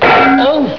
frying pan

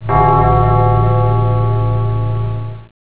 bell

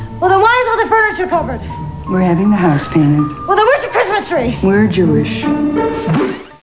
boo

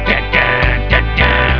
da daa da daa

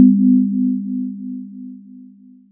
bell

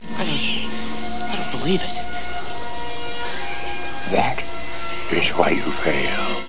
fail

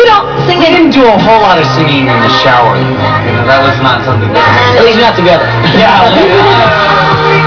shower

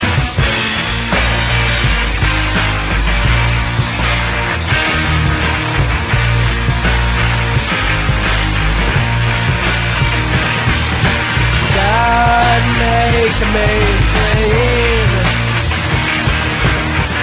clean

